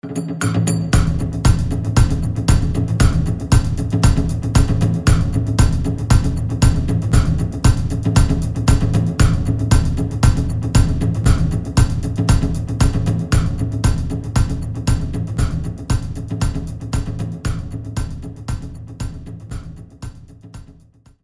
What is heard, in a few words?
Jingle Rank Match; Taiko no Tatsujin Drum Session; Session de Dodon ga Don; PS4